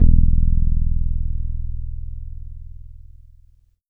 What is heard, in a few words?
UP RIGHT BASS; USB Soundscan vol.12; AKAI; Bass Analog & Synth